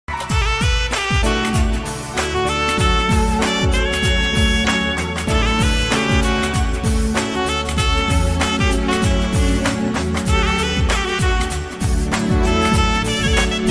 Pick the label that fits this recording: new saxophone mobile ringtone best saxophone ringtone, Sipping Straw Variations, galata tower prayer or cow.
new saxophone mobile ringtone best saxophone ringtone